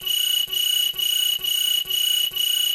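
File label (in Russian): elektronnye zvuki peredachi dannykh, electronic data transmission sounds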